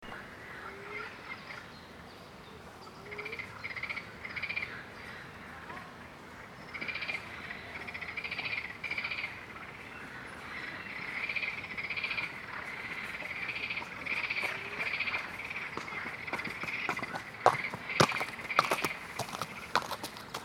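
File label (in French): Pluie, Paysage sonore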